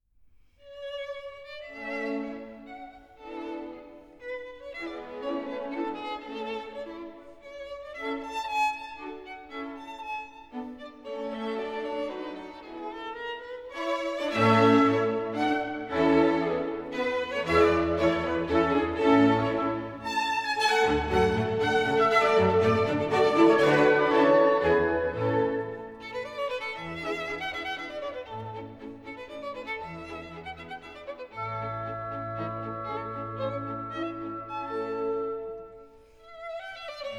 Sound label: Violin Concerto No. 5 Rondeau Tempo di minuetto; Mozart